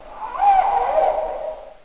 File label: TWETWOO, animals